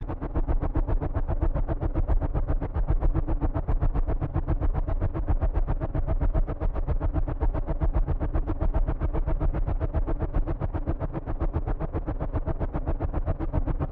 Source Rotors Propeller Scifi Futuristic Constant Engine; Passby; PMSFX; Scifi Passby [designer Series]